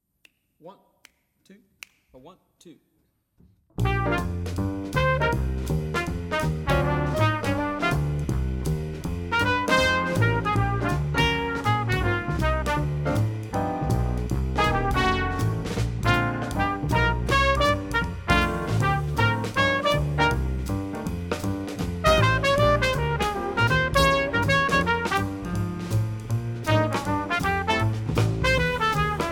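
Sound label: Jazz Etude Trumpet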